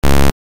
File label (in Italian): suoneria beep